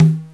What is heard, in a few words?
conga